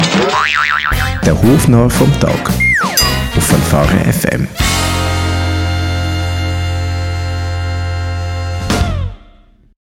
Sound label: Jingles Comedy